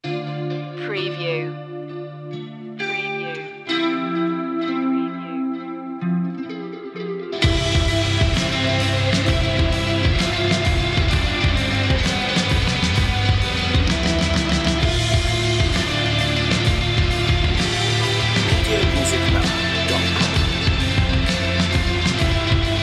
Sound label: slow